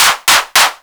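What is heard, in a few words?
clap, drive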